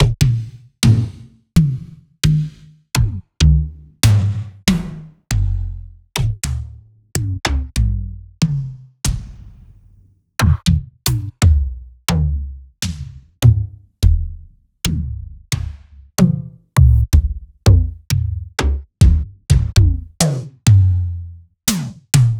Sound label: Toms Synth Toms; Ghosthack